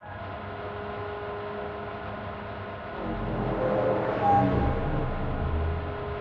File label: ambient port; World Of Warships; port3d